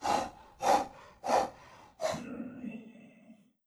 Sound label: Amb Train; Eve Amb Train C